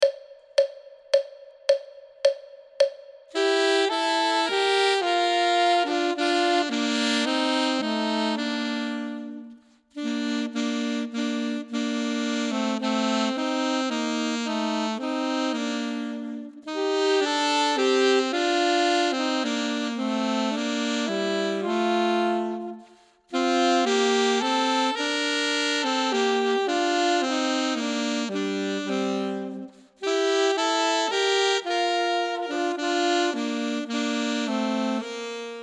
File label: duet; Ten Easy Duets